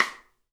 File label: SD STK; Sonic Reality; Interactive Drum Kits